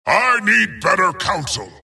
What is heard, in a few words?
death long; dota; skeleton king